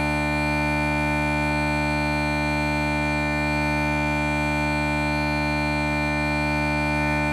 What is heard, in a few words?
DRONES, pipes; Grahm's pipes